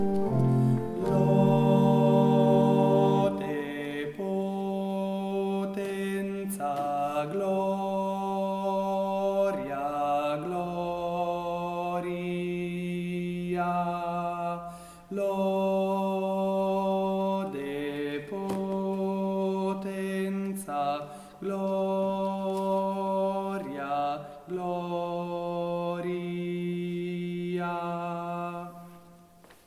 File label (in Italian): TENORI